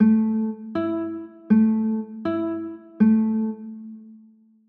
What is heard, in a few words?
a 6, chords